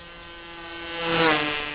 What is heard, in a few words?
mustang